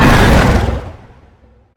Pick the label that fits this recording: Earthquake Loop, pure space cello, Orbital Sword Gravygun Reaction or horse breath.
horse breath